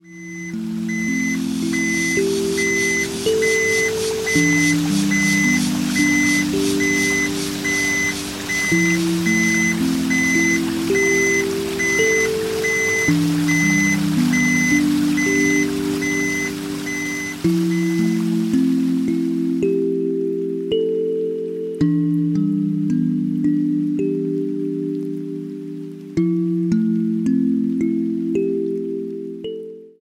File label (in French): kalimba